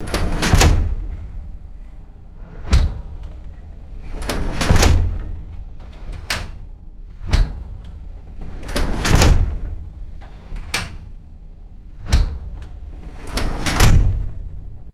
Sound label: heavy metal sliding door rolling and slamming; doors